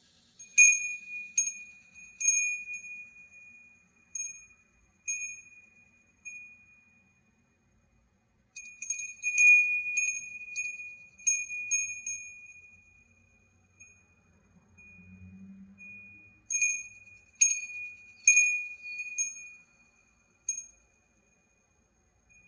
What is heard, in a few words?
Wind Chimes